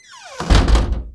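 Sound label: glass close; Mafie 1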